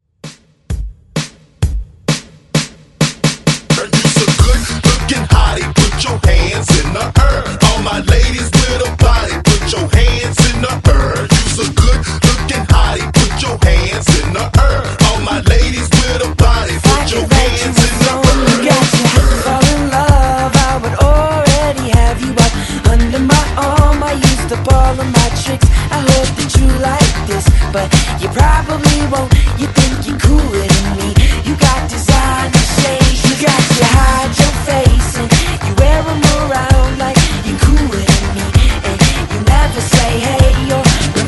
Hype Re Drum 130Bpm

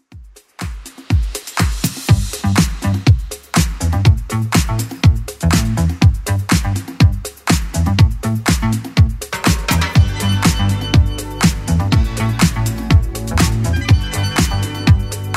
80s Club Edit 122 bpm

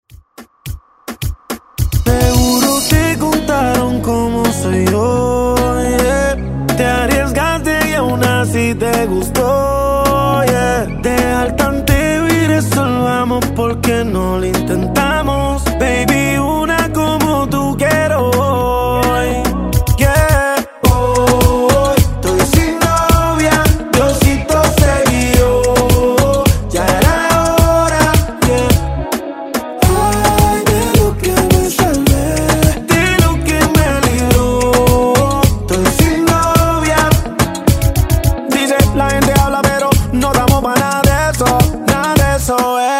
Reggaeton Redrum Intro

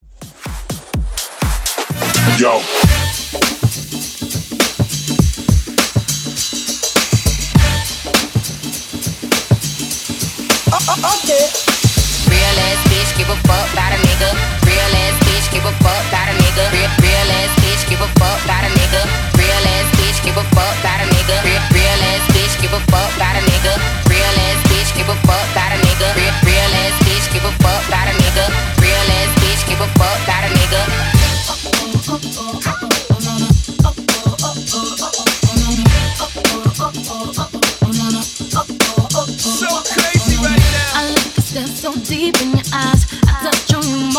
Transition Hype 99 125 BPM

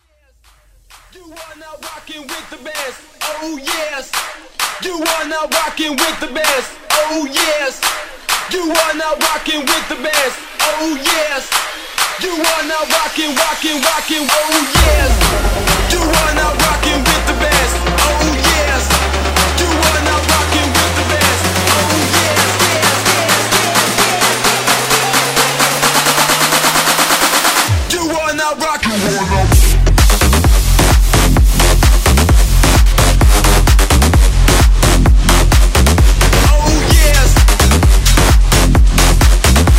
Clap A Pella Starter

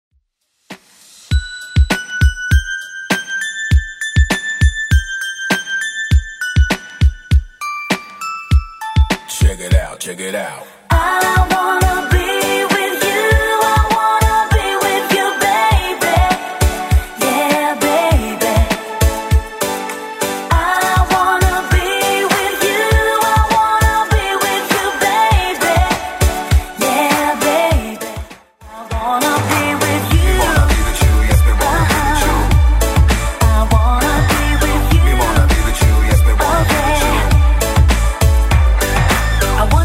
90s Redrum 100bpm

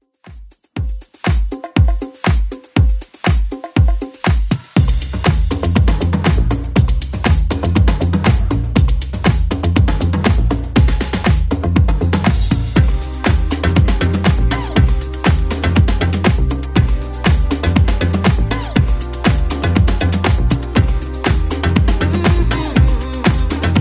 80s Club 120bpm

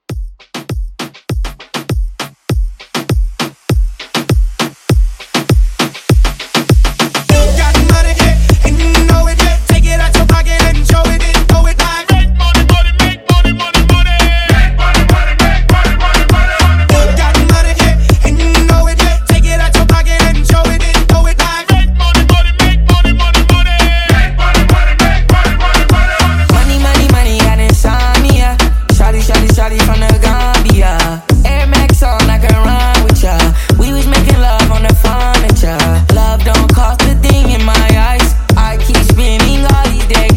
Moombah Hype Re Drum 100

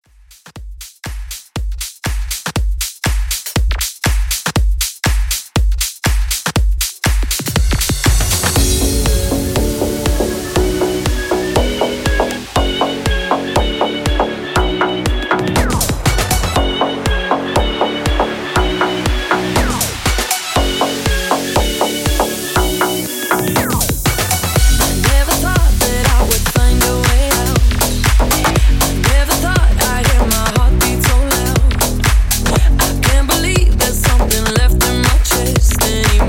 Club Extended Mix 120Bpm

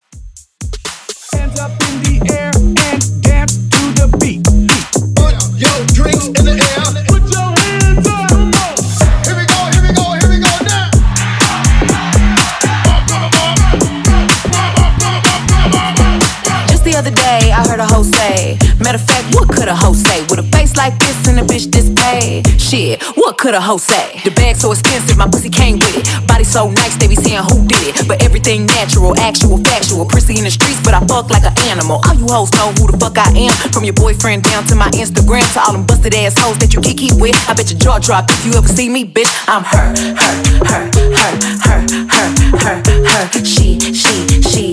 Re Drum Hype In Outro 125 Bpm

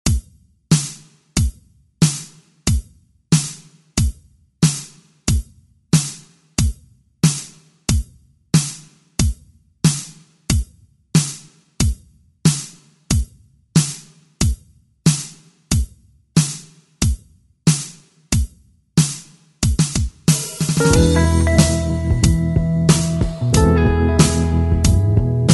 60s ReDrum; DJ Mhark

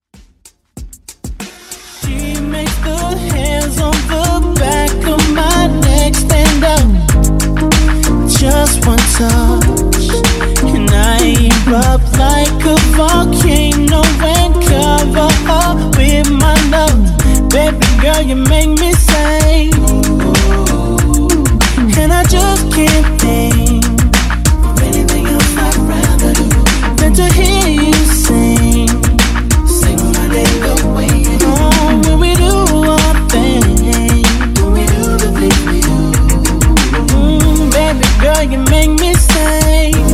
R&B intro outro 95 bpm

